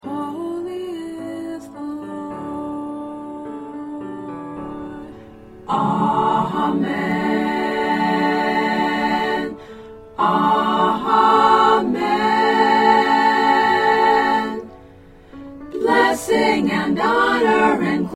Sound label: Amen